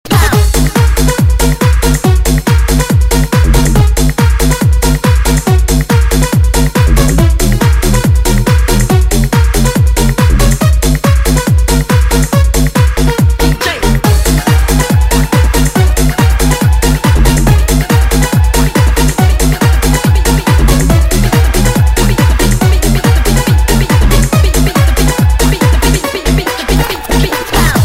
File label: Beat Down